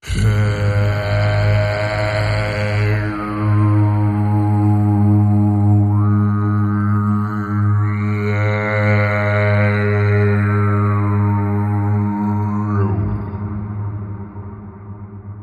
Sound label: Emperors Hum Drone